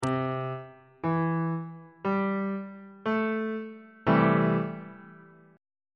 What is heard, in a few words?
b sharp major 6th chord on bass clef